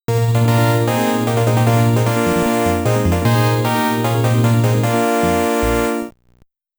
Start Music, Again

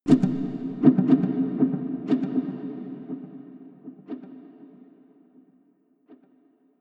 tribal echo

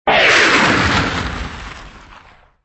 Rocket Sound

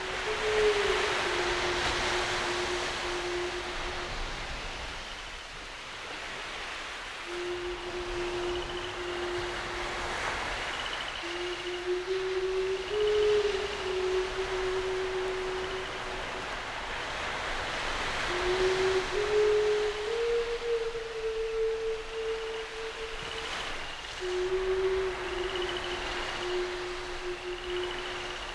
Beach Ambience

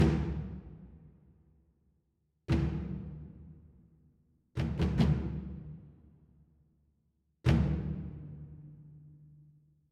Timbani combat; raji an ancient epic

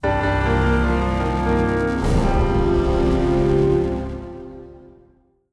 Jingle Lose; Raiden V: Director's Cut

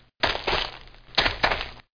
Deer Leaves Loop

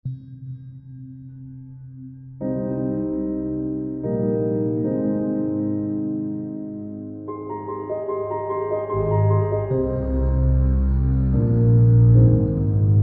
sad safety

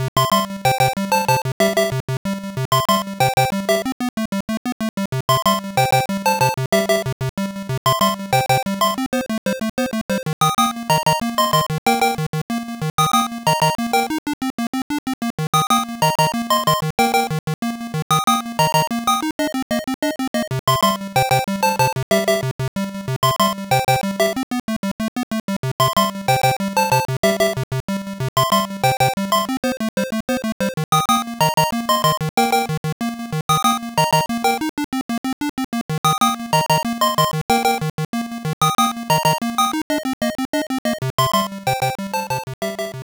Minigame BGM; Kikansha Thomas ni Notte; pico gamerip 1995

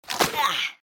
Fall; Troll Face Quest Unlucky; Android

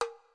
Coin; Temple Run

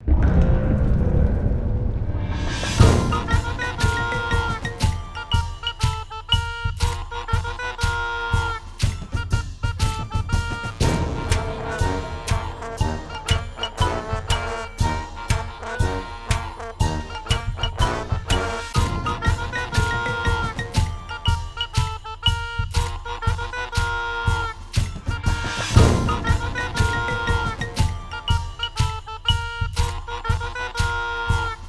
Stinger Extended Sustain; Scrap

